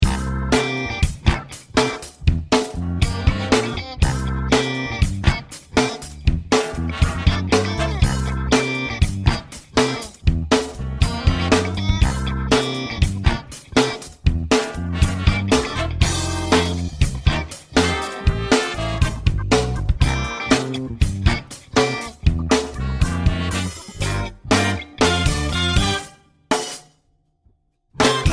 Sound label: funky lunch shuffle